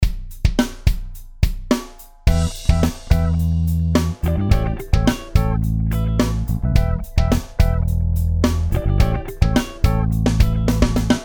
Pearl 24 Kick Low Tuning

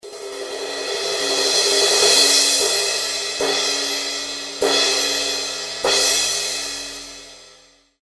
Paiste 2002 18 Crash

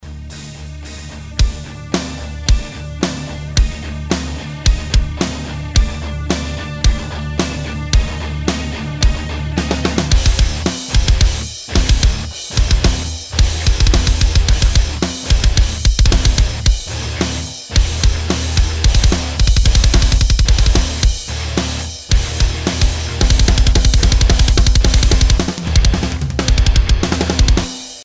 Pearl MCX 22 Kick Open D; Drum Werks

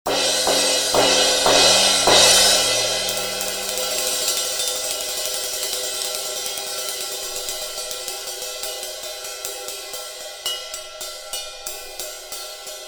Zildjian A 18 Crash

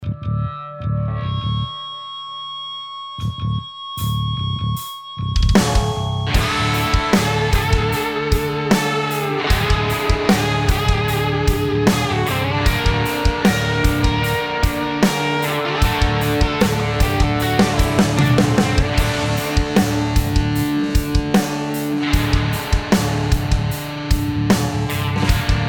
Ludwig 5x14 Black Beauty Snare Drum Open

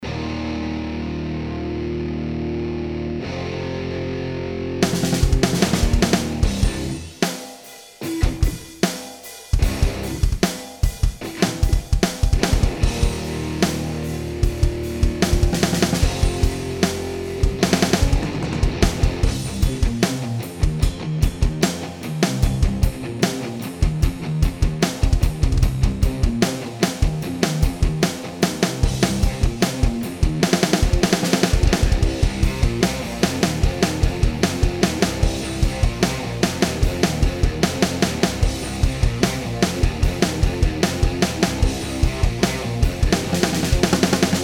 Ludwig 5x14 Acrolite Aluminum Snare Drum

